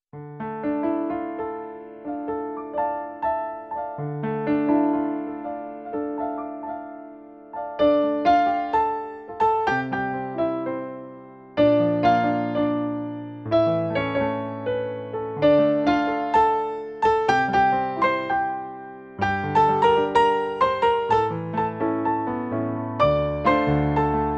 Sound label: Piano Only